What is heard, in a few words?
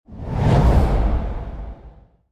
ui talent focus talent point